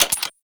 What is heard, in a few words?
Rifle Alt Mech; Warframe; Weapons; Tenno; Sundial